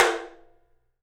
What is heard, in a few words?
open tom; 3D Sound; 3D Drums